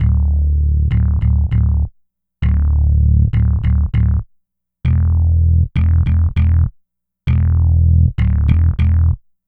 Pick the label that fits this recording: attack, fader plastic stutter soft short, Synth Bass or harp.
Synth Bass